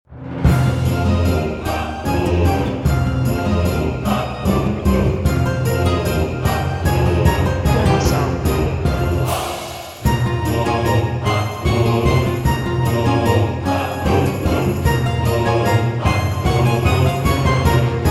Pirates in the Bay Choir

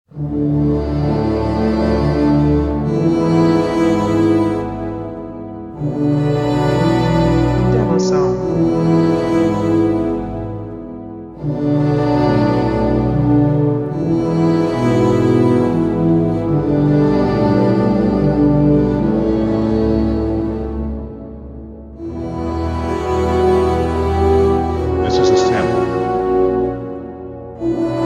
Loop

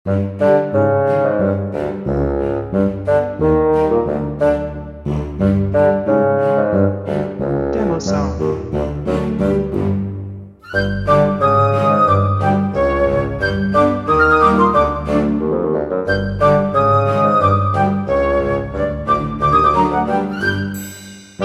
Flute

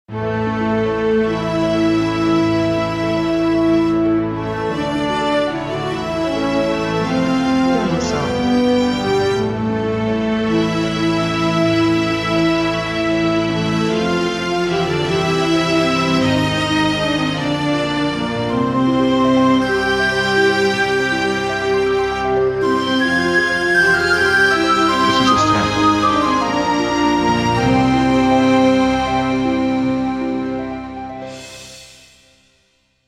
Majestic Bright Epic Intro or Happy Ending

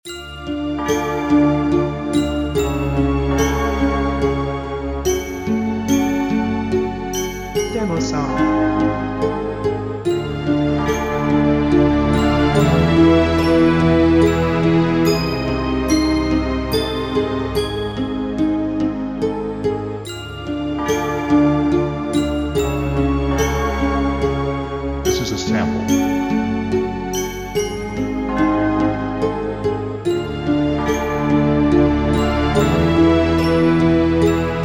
In the Halls of the Dead Harp and Bells

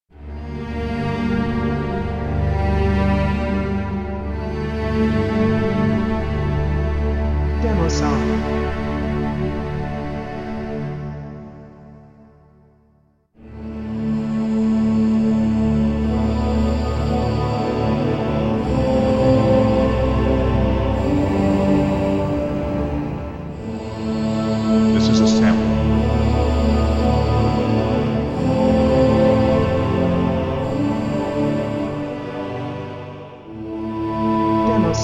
Emotional Moments Choir

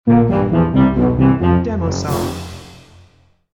Jolly Snippet Clarinet Tuba

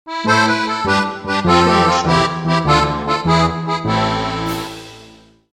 Accordion

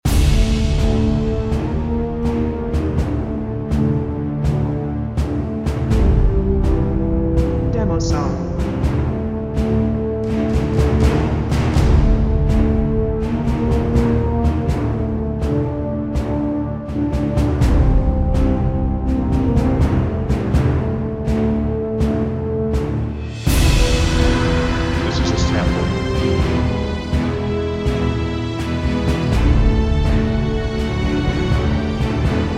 Majestic March to Battle Loop

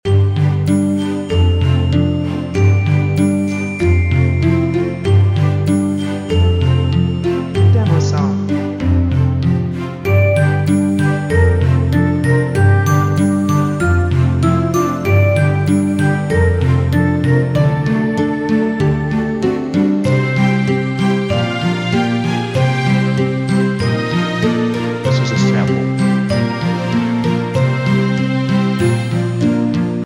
Tricky Adventure Loop

